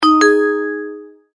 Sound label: multimedia tone verified